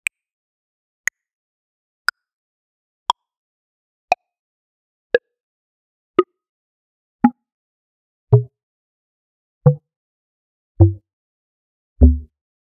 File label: Click Clack Tick Tock Blip Pop